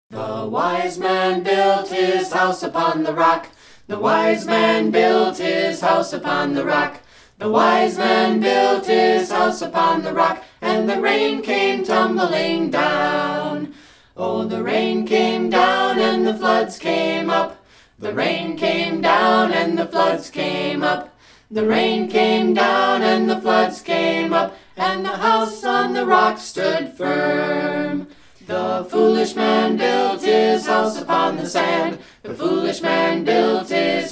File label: only singing; Wise and Foolish Man